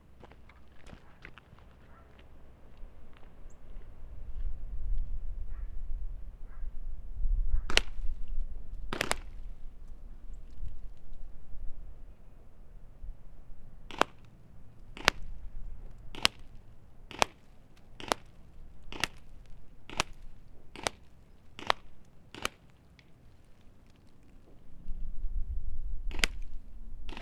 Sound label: splats asphalt long barrel close